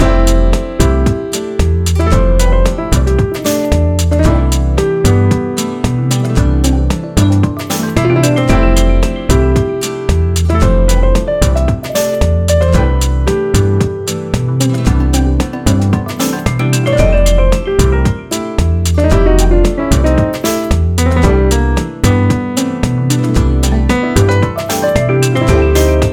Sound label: bgm